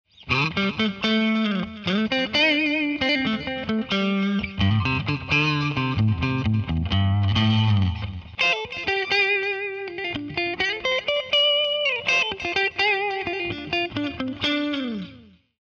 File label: bridge neck